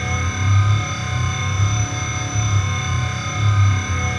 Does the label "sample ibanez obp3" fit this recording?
No